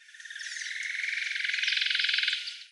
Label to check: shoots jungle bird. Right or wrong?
Right